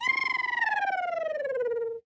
kiwi howl

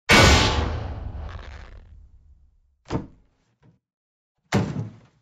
drop safe

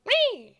beaver exclaim

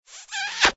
smooch impact

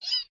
rabbit indifferent

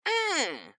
armadillo short

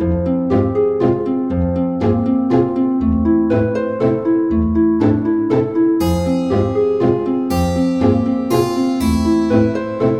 boss lobby

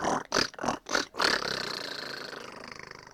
gator long howl

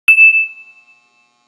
zap lightbulb on

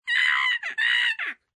monkey exclaim

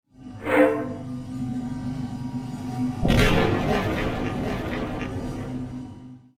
rise from the scrap

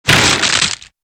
woodchipper

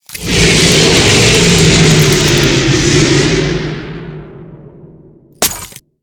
snow squall

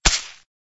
whole pie only

